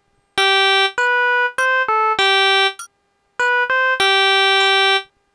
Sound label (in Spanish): ejemplo compas 2/4; Observa como se escriben las figuras en un compás; Educacion musical